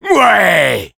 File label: cheers, fr; Sniper